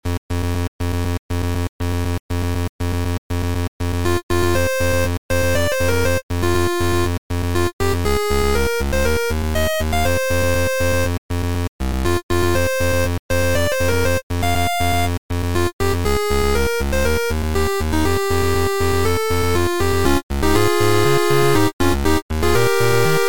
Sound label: Effortless 8-bit Main Menu